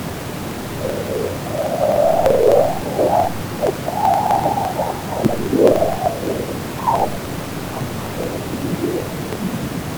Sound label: jupiter sound